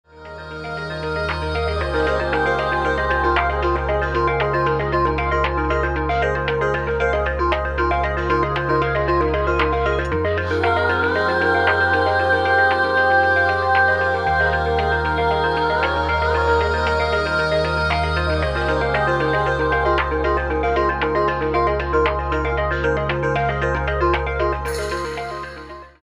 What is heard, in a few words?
ambient chill